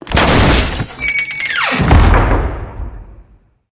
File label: trade up crate smash